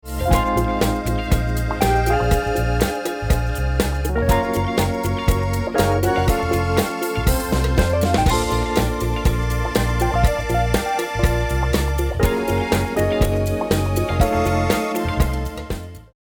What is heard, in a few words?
funk, funky drums; studio hamburg; deichtv schlagzeuger; dirk wagner mixing johannes huth